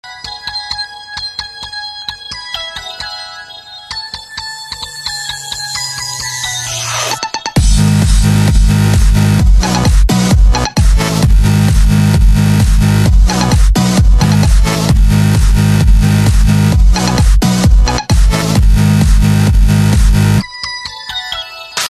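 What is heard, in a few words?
New Year bass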